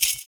FOOT PERC; Univers Sons - Raricussions

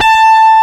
A4, GUITAR; AKAI S-Series CD-ROM Sound Library VOL-8

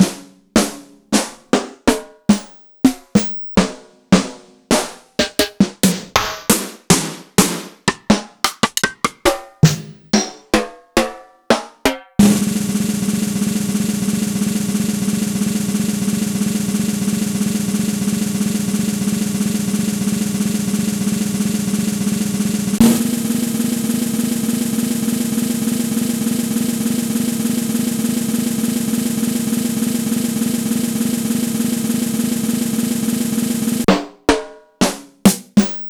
Yamaha DTXpress Acoustic Snare

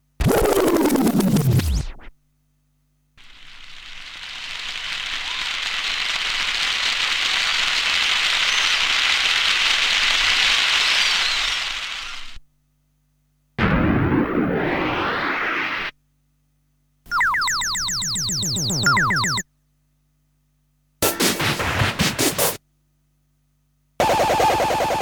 Sound Effects; Kniteforce; 1000 Samples Volume 1

